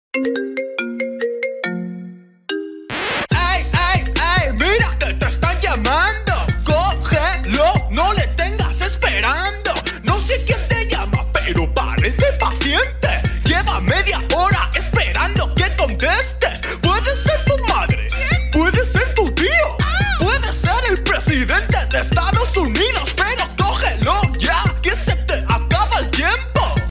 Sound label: iphone remix